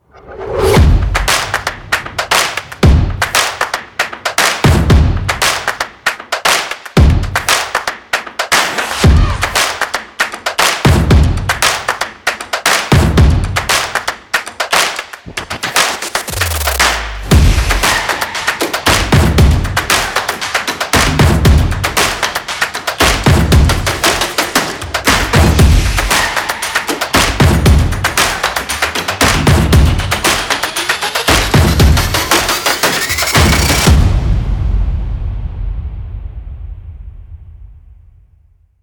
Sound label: Upbeat Stomp Clap and Percussion Short Version